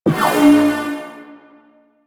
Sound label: Shot Layer, Blastor Pistol; Warframe; Weapons; Corpus; Plinx